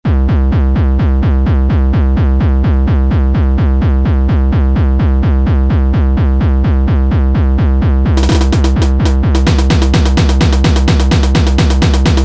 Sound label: speedpop hardcore